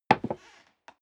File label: step leather shoes wood creak single stair down